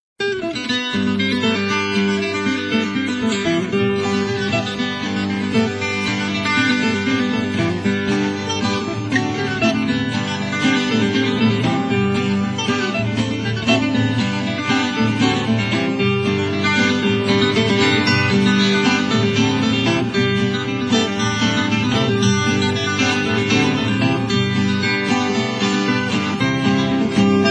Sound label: Instrumental; minas gerais